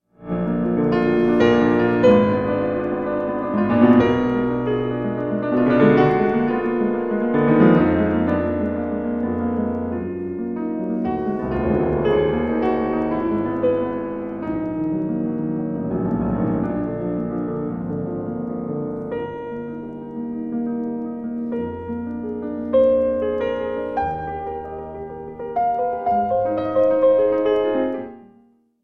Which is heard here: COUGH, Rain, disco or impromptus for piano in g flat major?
impromptus for piano in g flat major